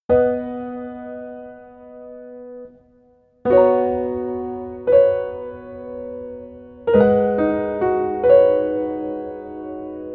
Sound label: Piano